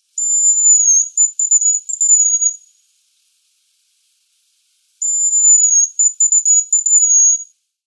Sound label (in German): Haselhuhn Bonasa bonasia; Vogelstimmen